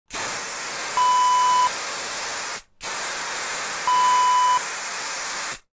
aerosol can

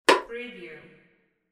beer can table

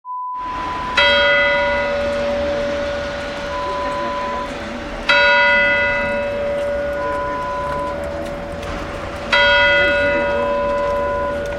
Austrian Town sound

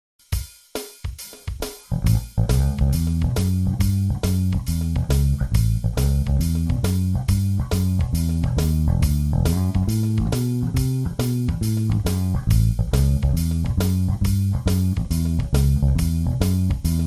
Shuffle blues line in A

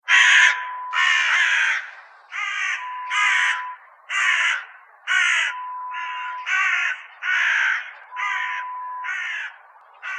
crow sounds

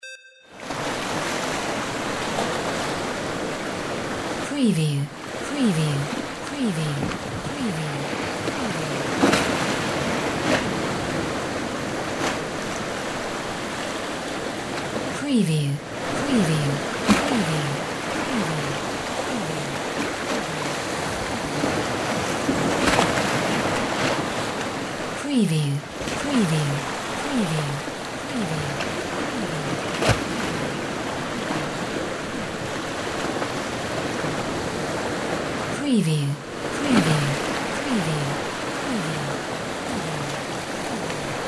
SEA ROCKS; windy beach audio

